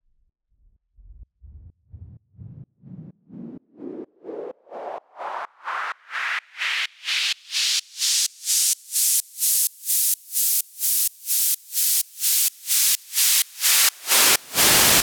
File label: Raise SideChained